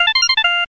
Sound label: All Red Coins